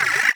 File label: driller; awe32